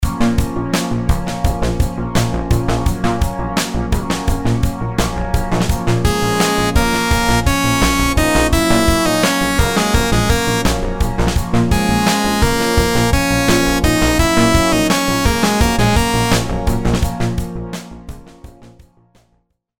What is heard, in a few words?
rhythms simple dotted 8s 85